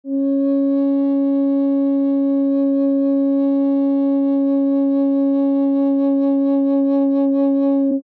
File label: Bass C Sharp, Flutes Single Note